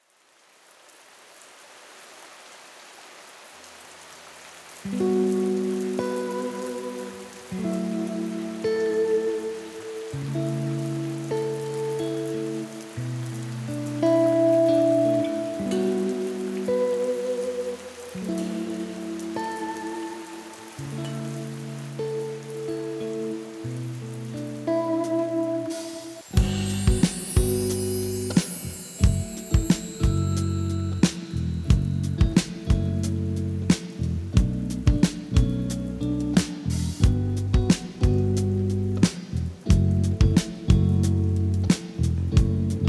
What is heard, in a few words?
rain station backing track